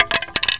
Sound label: racket fall; Virtua Tennis